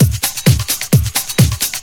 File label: drum 130 groove; Techno Trance Essentials